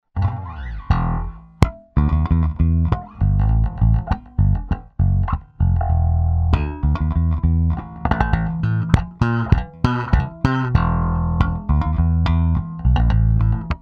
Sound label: RETRO SLAP, Zoom 506 II